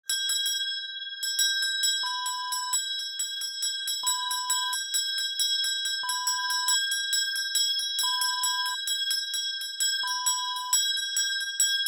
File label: handbell